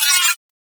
space drone death; Warframe; Corpus Space Drone

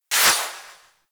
Full Draw Tail, Weapons; Warframe; Infested; Cernos

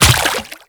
Step Water, Footsteps

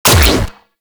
Fire; Warframe; Weapons; Acrid

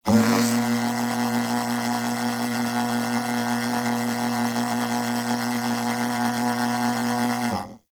Tenno Animal Foundry Working Slow Down, machines; Liset Machines

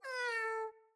Kitten Meow Playful, Enemies; Catbrow

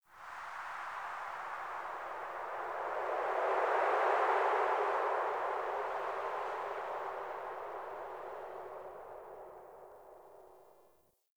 Dark Wind; Warframe; Tau

